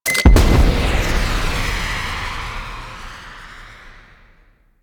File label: Hub Concourse Deploy Success; Warframe; Ambience; Tenno Hub Concourse Fragment